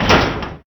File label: door; scummvm; The Griffon Legend